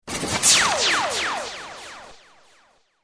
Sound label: Laser